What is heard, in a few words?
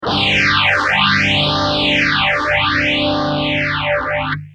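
guitar boss ph3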